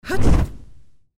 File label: Trample Random; Goddess of Trampling